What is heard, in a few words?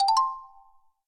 call connect; tweb-i2p